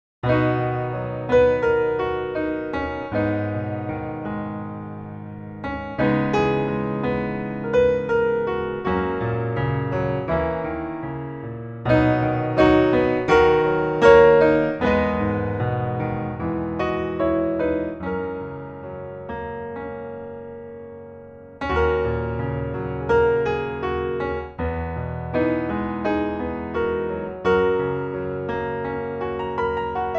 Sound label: piano; maoudamashii